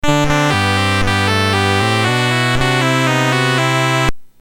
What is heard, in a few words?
CSG8580R5, GAMES; Who Killed Cock Robi BASIC; HVSC